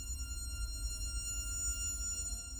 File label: Kelele, Kelele Drones; World Percussion